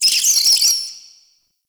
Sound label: Interior Spider Chatter; Warframe; Duviri Murmur; Ambience